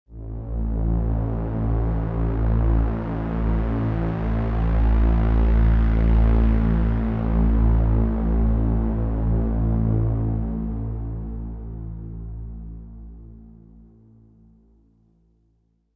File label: space bas; Factorio - Space Age